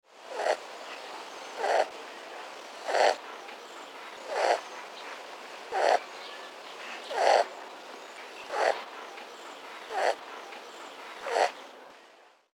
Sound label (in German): Feldhase Laute